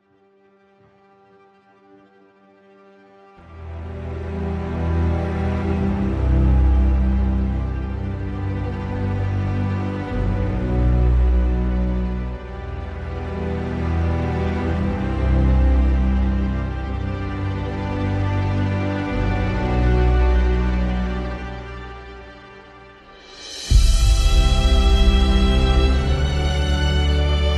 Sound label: No Piano